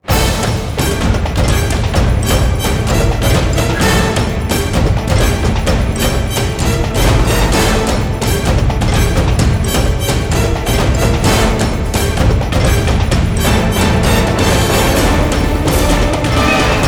Instrumental Ending

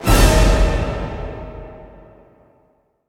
Orchestra Hit

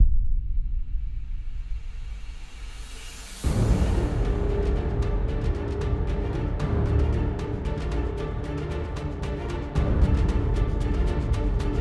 (Orch)

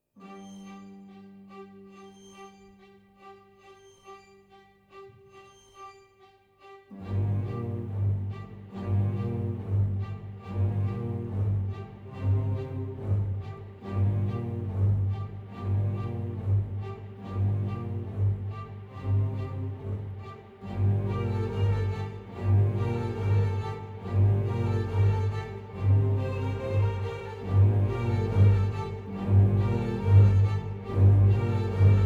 No Perc

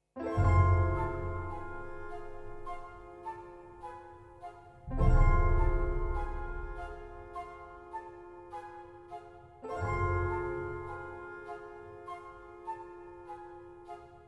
No Choir

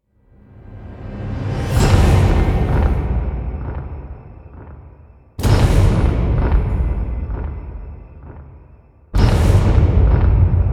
No Choir

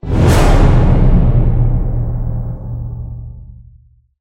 Orchestra Hit

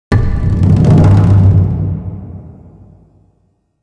Orchestra Hit

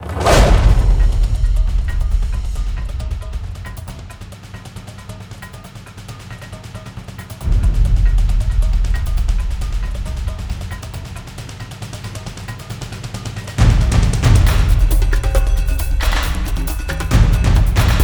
Industrial Mayhem, Drums

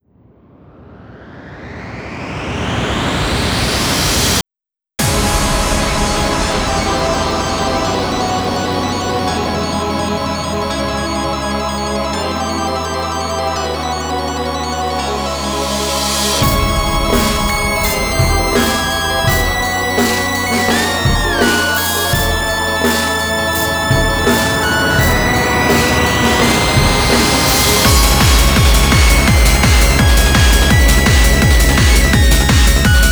instrumental

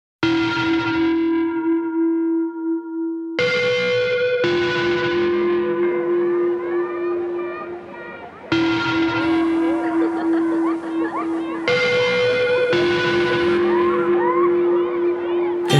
Acoustic Version

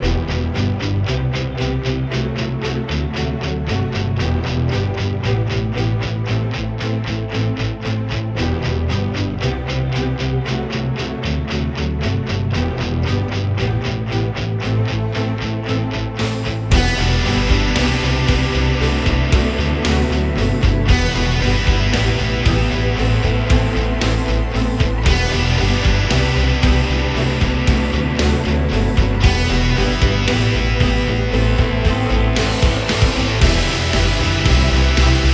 (No Choir)

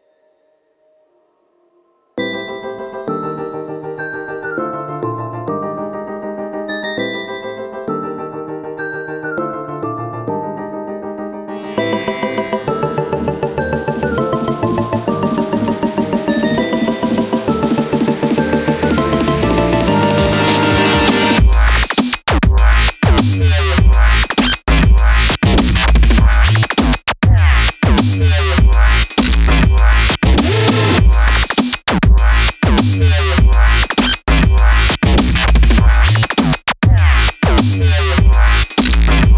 instrumental

